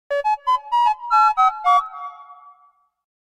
goofy ahh notification

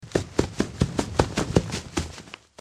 foxy running; fnaf

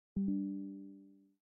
notification; windows 11

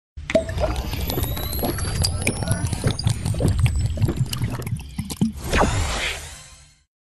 shield potion; fornite

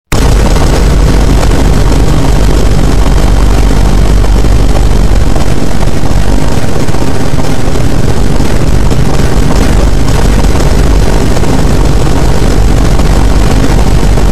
vine boom overload

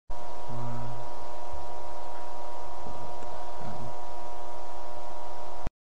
backrooms ambience

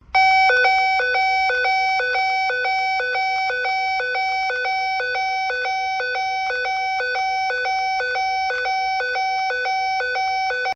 campus fire alarm

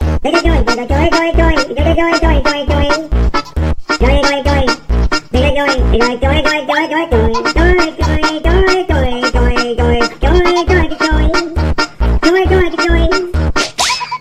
doi doi doi phonk

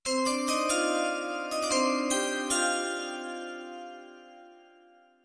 draft chime; nfl

